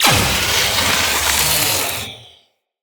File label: Energy Leech Activate; Eximus